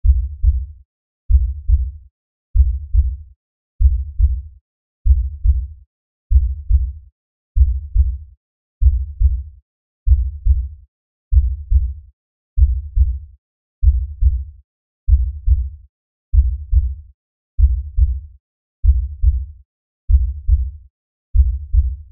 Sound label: Lfe Pulse Low Bass; Drone; Blastwave